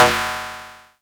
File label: percussion, drum machines, FutureRetro FR777